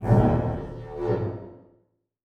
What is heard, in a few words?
Transition Hit Impact Electronic; Adobe; Production Element